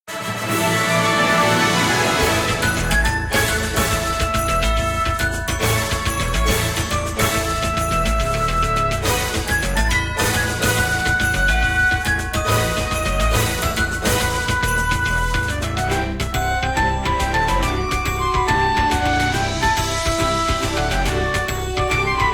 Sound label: Victory Fanfare; Echoes of Mana